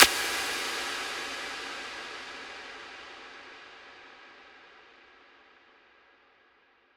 Impact; Cymatics

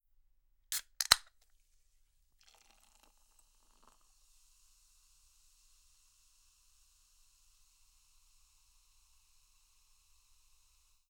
Soda Can Open Pour; Fairlight Sound Lib

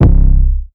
808 (C); Blunt; Cymatics; GHOST (Beta Pack)

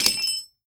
Metal Drop; Fairlight Sound Lib

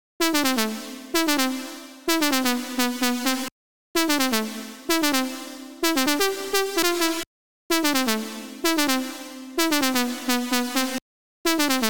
128 BPM A Min Lead; Drop Loops; House; Cymatics; Paradise

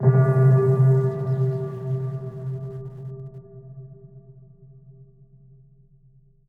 Synth Pad C, Lo-Fi; HipHop; Melody Kits; Ghosthack; Home 74 Dm

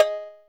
Percussion, Hip Hop; Drums - One Shots; Cymatics - 9God Hip Hop Sample Pack

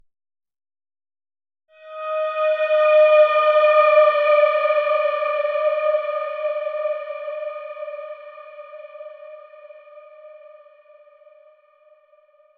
Distraction

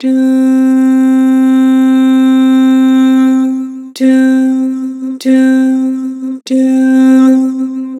"Doo", Vocals; Harmor

